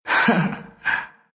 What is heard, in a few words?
laugh, ru; dota2